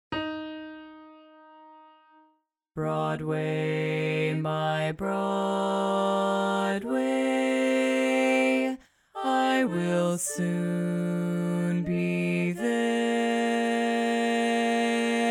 Bass Dominant

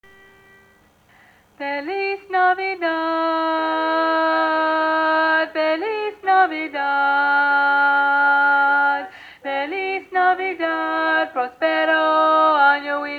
Lead

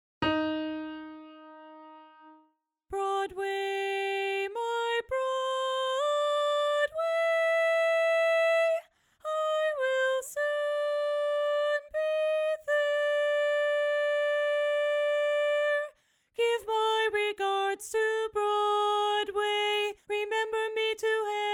Tenor Alone

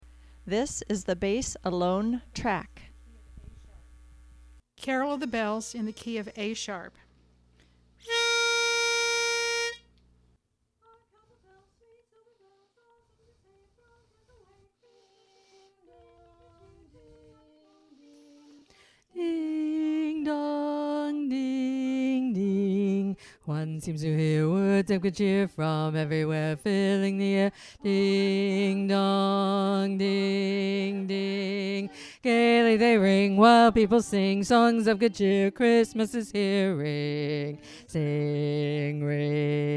Carol of the Bells Bass Alone, chorus

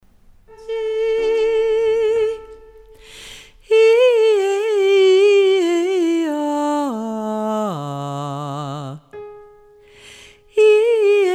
Mid Low Range Exercise; warm-ups

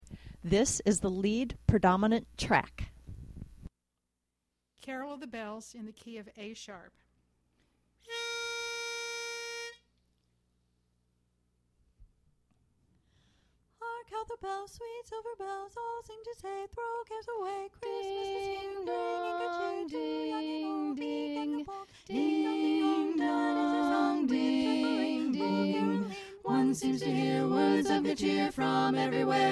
Carol of the Bells Lead Predom, chorus

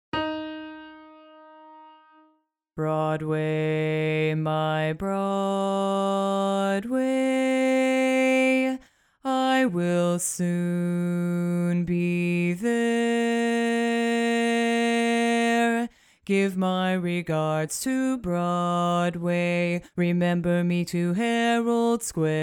Bass Alone

